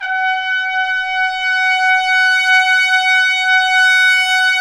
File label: BRS TP SWE, Orch Ensembl; Roland L-CDX-03 Disk 2